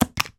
household video game controller drop on carpet floor; nintendo wii